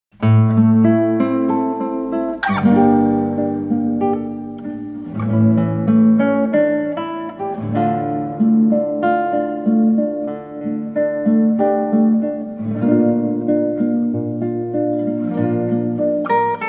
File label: Guitar Play